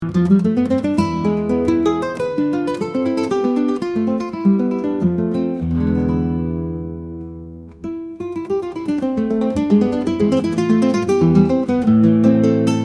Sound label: passionate strings